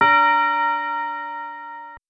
bell D5; osu

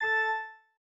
organ

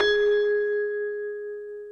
Bell G#4; osu

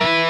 bend half

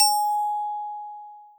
G#5 bell

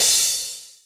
soft hit finish

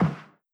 Snare Wet

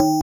BELL

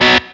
Bm staccato; osu

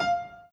Piano Chord

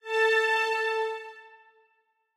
Strings

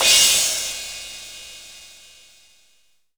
cymbal

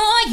vocal

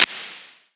drum hit clap

